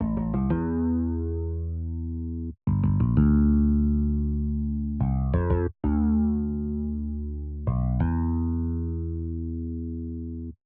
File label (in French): compo msm basse